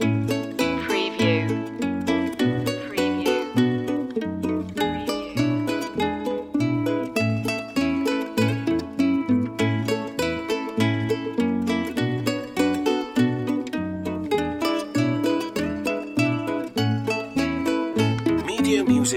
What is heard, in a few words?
jingle bells ukulele loop